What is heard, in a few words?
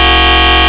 Spectrum Harmonic, wavetables; Animoog